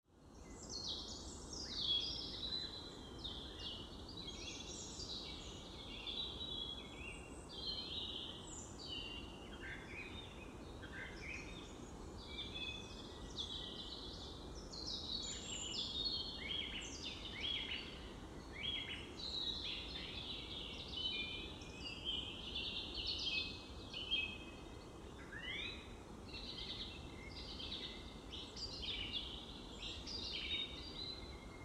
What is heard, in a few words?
Spring Dawn Chorus in the Forest Meadow; Ivo Vicic; Mountain forest meadow late Spring; Wind Is the Original Radio